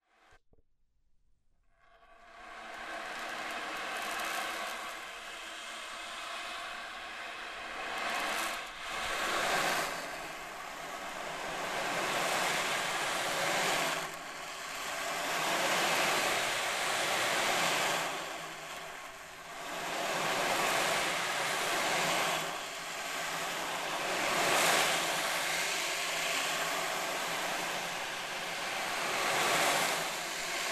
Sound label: Ocean; Goki